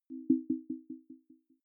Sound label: button echo chord; maschinen; signale